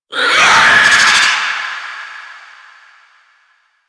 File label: Infested Scare Vocal; Ambience; Warframe; Corpus Infested